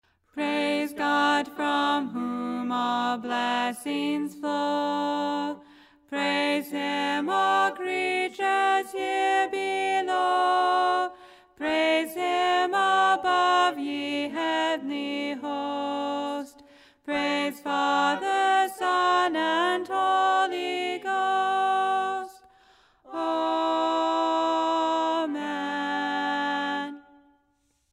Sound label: Doxology Alto; audio files for the different parts of the Doxology to be prac; Gospel Church